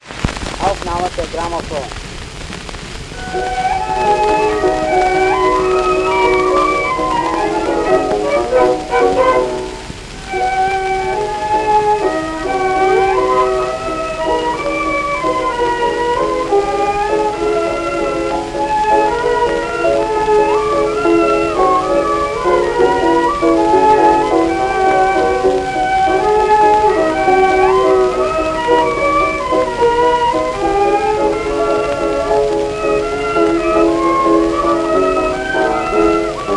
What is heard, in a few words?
Polka; Die Kitty